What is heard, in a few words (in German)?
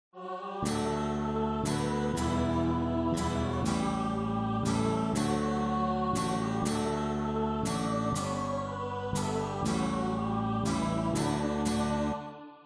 Schneefloeckchen Weissroeckchen